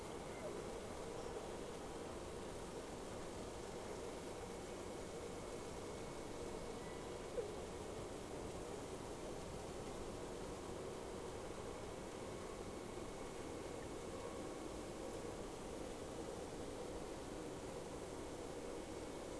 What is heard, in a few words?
Whales; SeaSnake